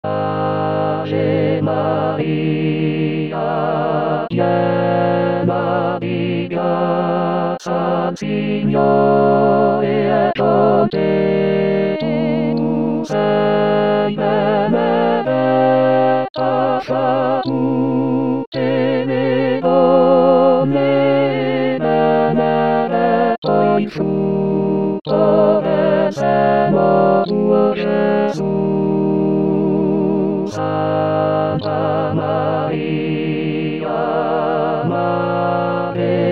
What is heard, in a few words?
Ave Maria Tutti; Ave Maria Italien